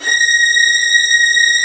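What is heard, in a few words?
violins A#5; VIOLIN2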